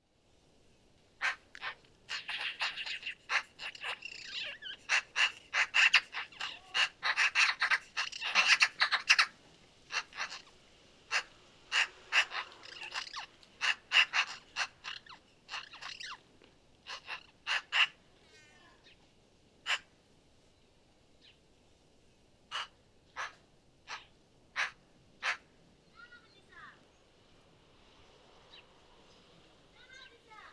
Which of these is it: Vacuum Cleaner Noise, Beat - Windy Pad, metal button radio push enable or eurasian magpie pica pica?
eurasian magpie pica pica